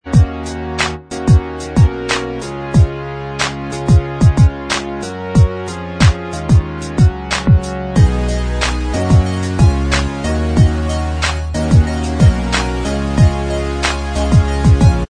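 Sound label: instrumental, track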